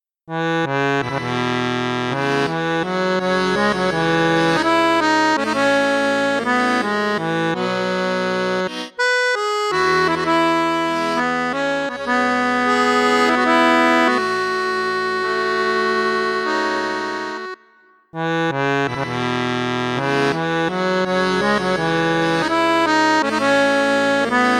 registre basson, paolo soprani accordeon

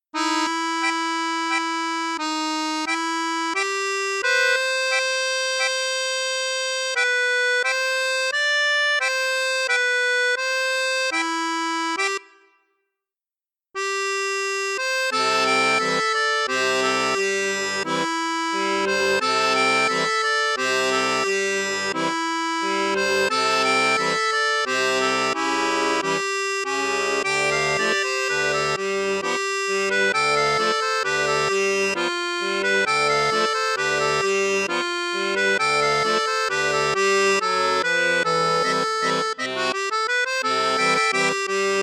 registre flute juste, accordeon; cavagnolo vedette 10 super

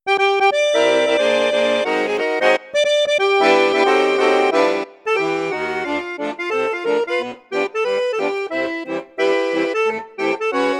registre basson flute juste flute haute, hohner vivaldi accordeon; registre basson + 2 flutes

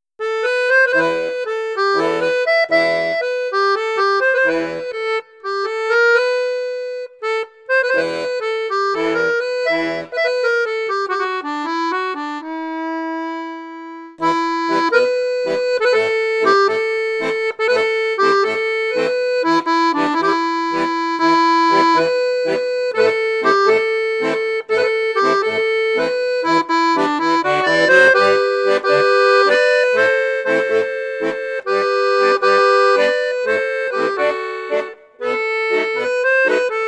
registre basson; mengascini f11

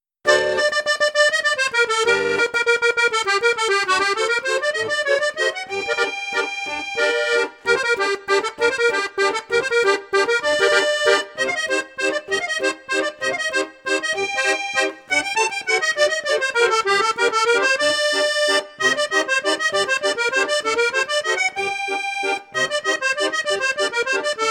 registre flute juste flute haute, accordeon; cavagnolo virtuose 4

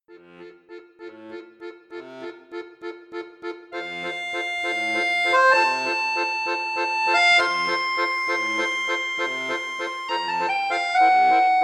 registre 8 boite, accordeon; modèle avec option musique a mano; bugari 580 ars c; Concerto pour piano no 21, K. 467, Andante; composé par W.A. Mozart

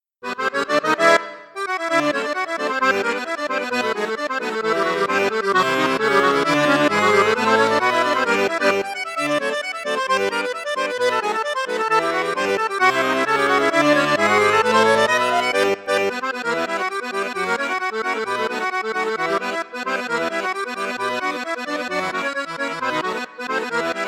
registre basson flute juste flute haute, crucianelli accordeon

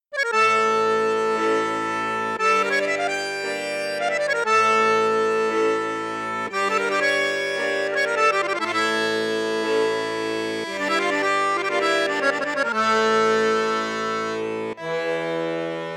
registre basson flute juste, accordéon; hava nagila; crucianelli 25

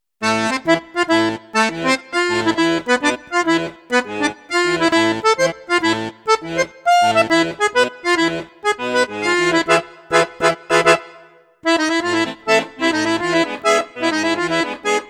registre basson flute juste piccolo, accordeon; cavagnolo professionnel 12

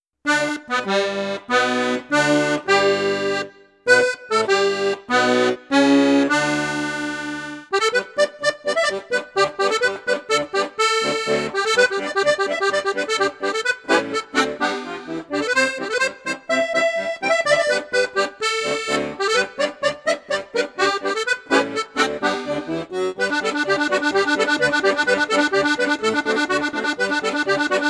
registre flute juste flute haute, accordeon; mengascini retro

